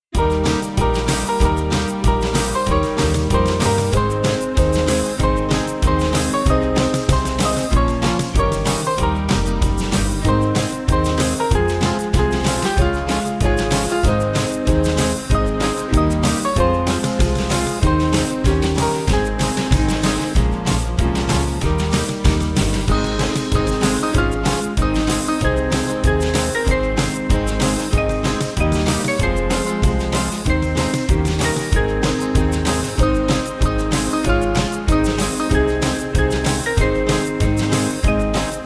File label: O Full Of Mercy, Band; Small Band